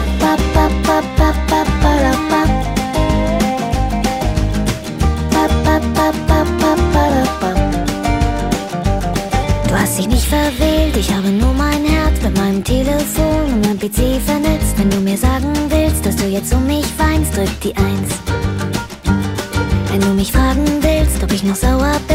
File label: ringtone